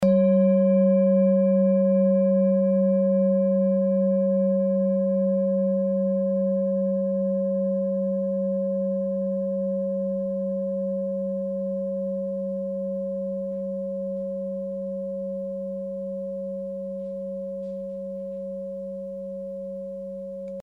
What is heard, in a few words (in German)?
klangschale orissa